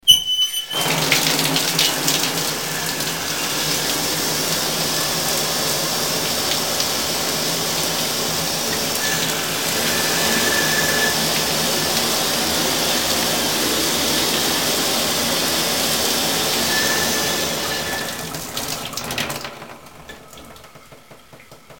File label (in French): Douche